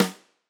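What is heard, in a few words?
Tam Snare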